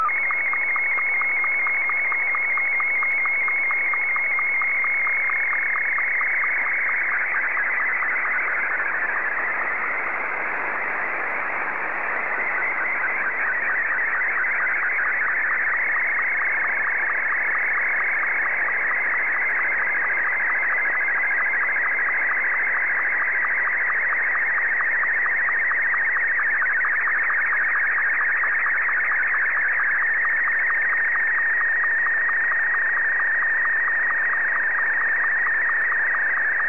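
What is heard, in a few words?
Martin 1, slow-scan television; VK3DFL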